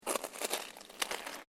SNOW CREAK; Material components for constructing more complex sounds; Snow (Sound Effects:Weather)

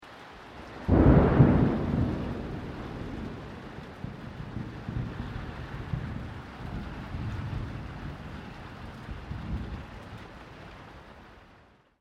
THUNDER MID DISTANCE RAIN

